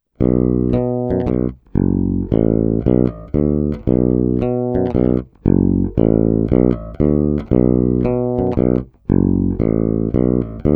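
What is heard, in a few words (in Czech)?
Snímač u kobylky, Jazz Bass; Sadowsky NYC Ultra Vintage J Bass Guitar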